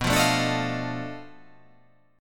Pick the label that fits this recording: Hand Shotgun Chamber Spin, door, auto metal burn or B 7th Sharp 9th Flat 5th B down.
B 7th Sharp 9th Flat 5th B down